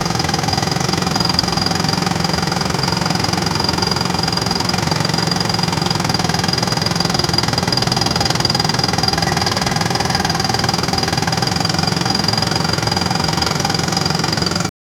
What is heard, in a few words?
sound of a corn truck, agriculture